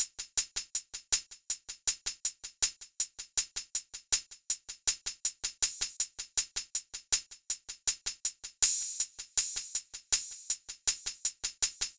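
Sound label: Cellular, ambient; Сэмпл Ударных (Ambient); Cellular G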